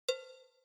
single cowbell strike; Calypso

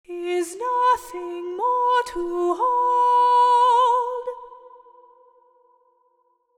Hush, Lullay, vocal phrase

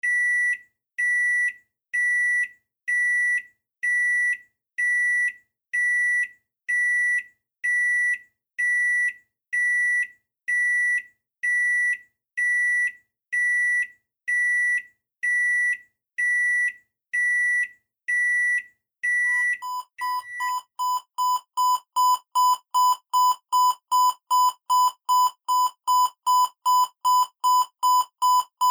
Electronic beeping

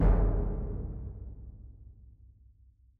single bass drum stroke

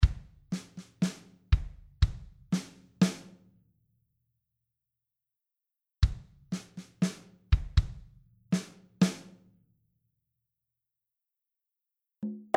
Rhythmic motifs, notes changed

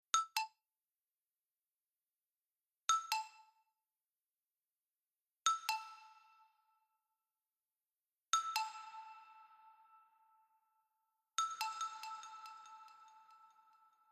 Xylophone – dry to reverberant; Sound-makers

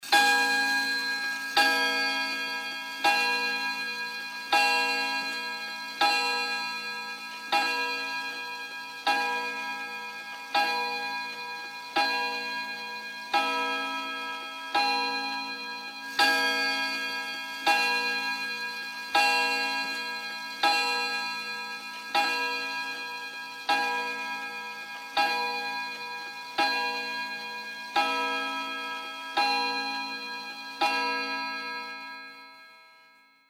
Repeated clock chimes

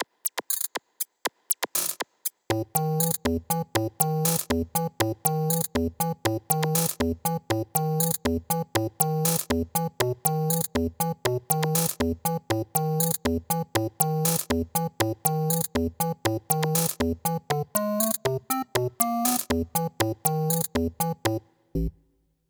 bass part (A minor)

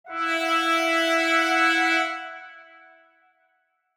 Hush, Lullay, semitonal cluster; Léonie Adams

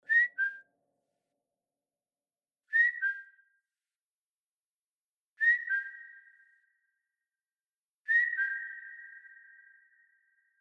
Whistling – dry to reverberant; Sound-makers

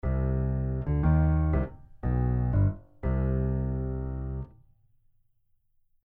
Melodic motif, repeated; Motif-makers

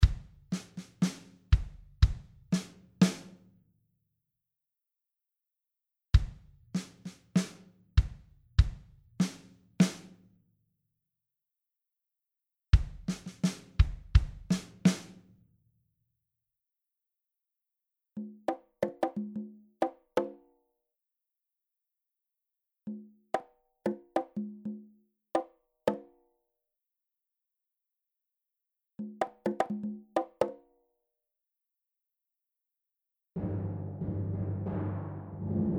Rhythmic motifs, tempos changed

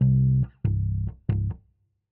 bass note C; Calypso

